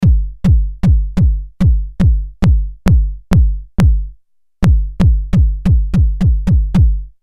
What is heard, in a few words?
pulse, jomox mbase 11